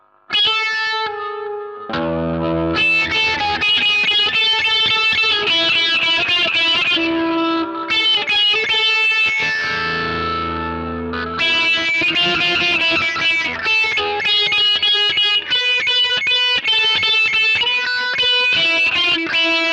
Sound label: sitar fx on